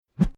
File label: Plastic Hollow Tube Stick Sweep By Whoosh Airy; Low Pitch; Tom Hutchings